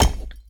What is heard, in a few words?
Environment Wall Hit